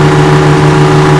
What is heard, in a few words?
cord; Mafie 1